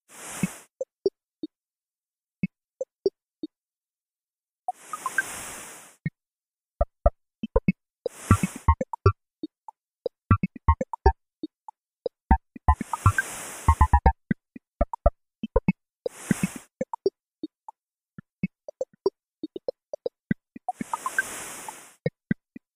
Cave Birds; Junk Jack; Terra

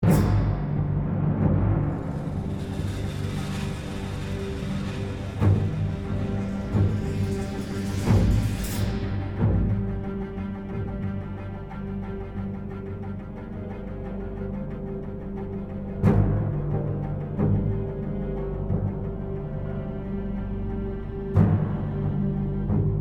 Stealth (Loop); The Walking Dead: The Telltale Series

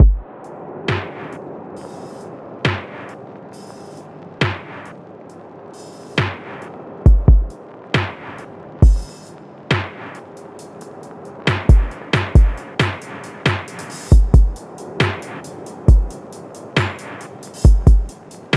City Ruins Stem; Epitasis

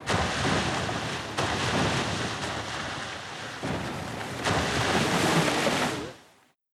Naval Battle

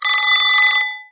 Timeout

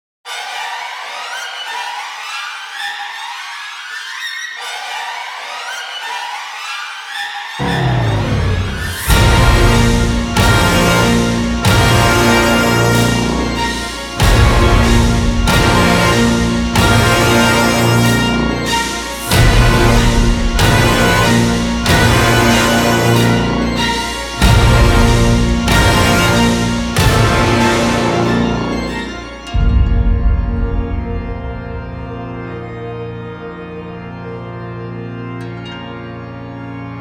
Dramatic Fight; Inmost

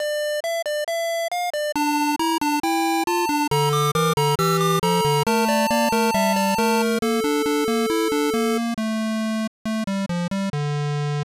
Crazy Climber Bird; Nichibutsu Arcade Classics; SNES

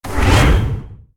Whoosh IN; Puddle; puddle plus ios gamerip 2018

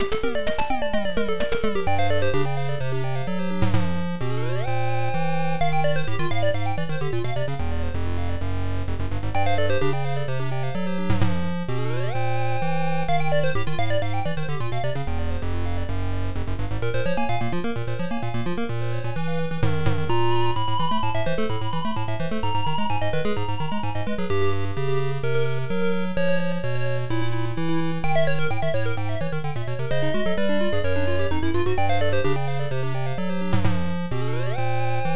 Main Bgm; Hi no Tori Hououhen; MSX2

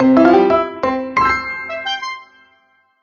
Levelup

